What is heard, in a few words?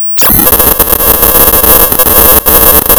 Sound of tearing paper, center